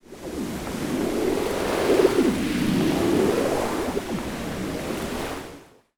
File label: designer water float; CrossCode